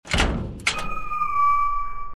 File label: door